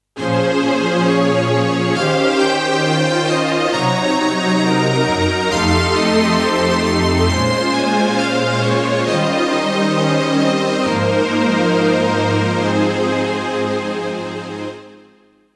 Strings; Thomann AK X1100

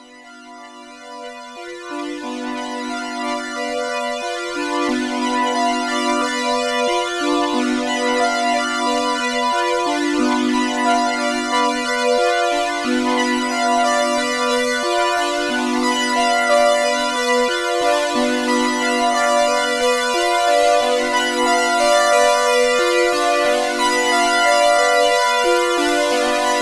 Polysix Poly

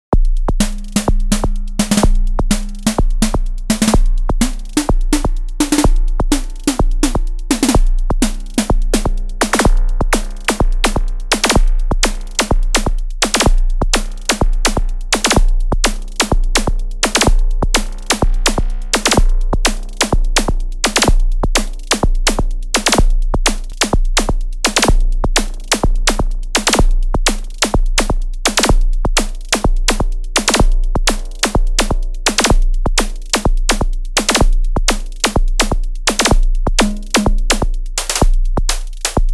Korg Volca Drum PM Snare

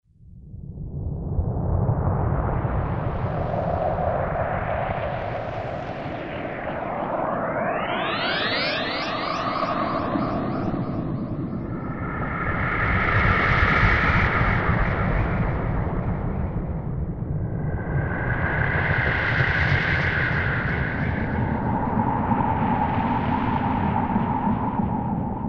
Noise Osc

